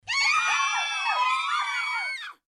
panic crowd

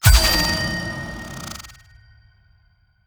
imp sword

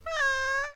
meow short

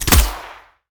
atk handgun

